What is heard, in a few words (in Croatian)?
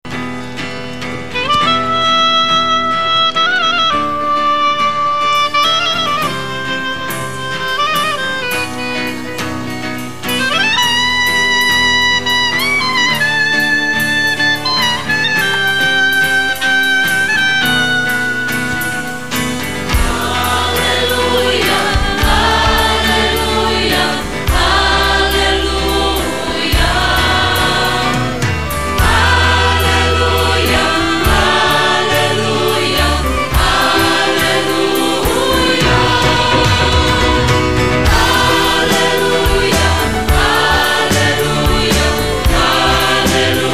aleluja arabska